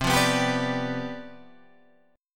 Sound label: C Major 7th Suspended 2nd Suspended 4th, Guitar Open G, chord